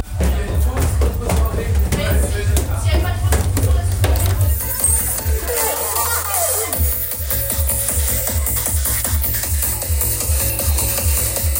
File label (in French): Ambiance; la techno sans filtre deconstruire les cliches